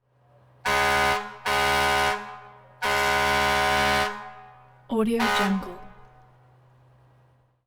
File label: fire truck horn blasts